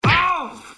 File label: Granny Hit; Mr. Bean - Bean Skidding